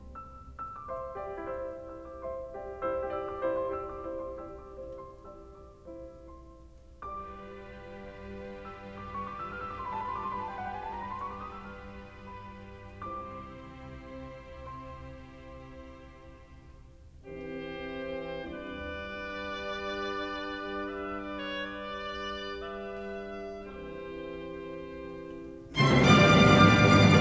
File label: Piano Concerto in C Major - Cadenza; Beethoven; Piano Concerto No. 1 in C Major, Op. 15; Cadenza by Glenn Gould